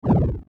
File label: Bar chart fade out animation infographics